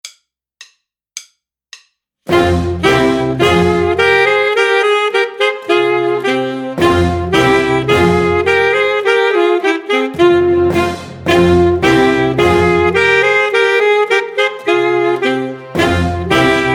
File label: Duo In G Minor Full Sax